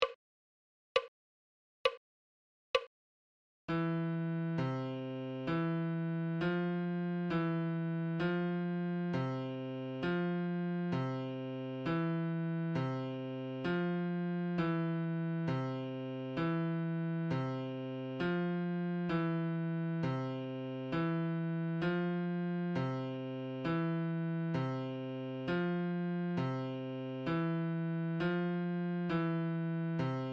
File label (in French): Clé de Fa, Adagio